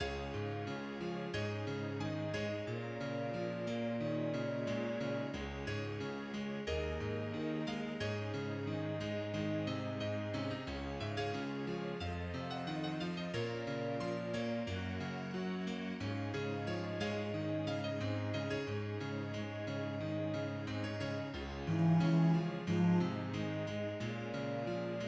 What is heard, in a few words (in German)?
Klavier Akkorde Bass Gesang